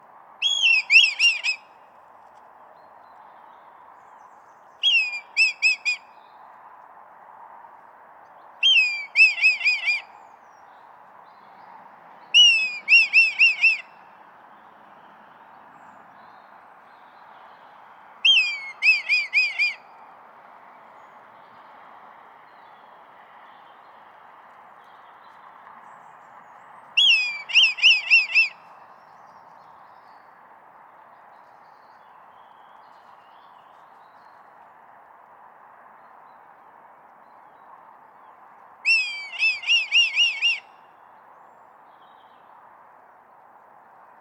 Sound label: Red kite; پرنده کورکور حنایی